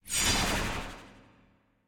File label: Teleport; Super Mombo Quest